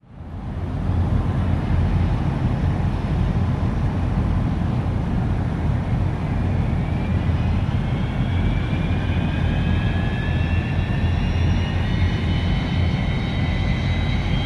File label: airport jet, land & takeoff